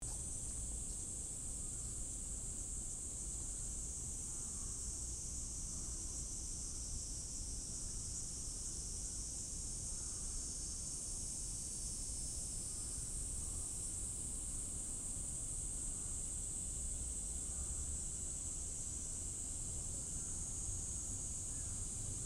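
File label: Nishi koen shrine; Terumo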